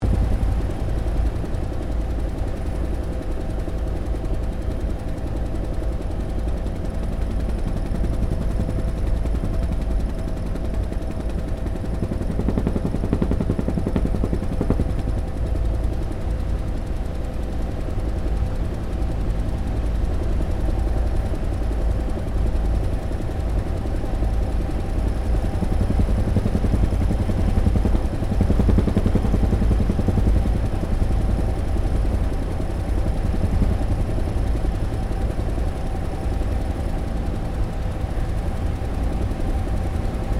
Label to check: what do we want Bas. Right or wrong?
Wrong